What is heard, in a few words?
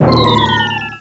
disappointed; trilogy